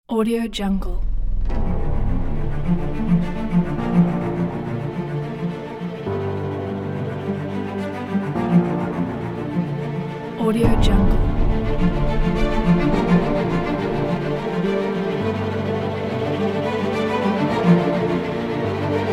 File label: hollywood cinematic pack